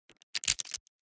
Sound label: lock picking